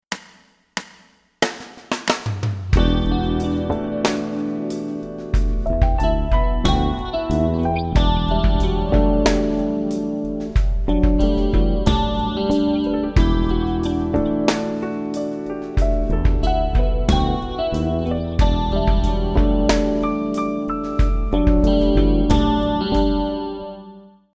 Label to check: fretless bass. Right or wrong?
Right